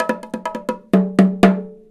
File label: 125 TIMB, Indian Traditions; USB Soundscan vol.45; AKAI